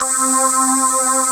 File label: VOX PULSER, DANCE; Pro-Rec - Synthsonic; Z1 DANCE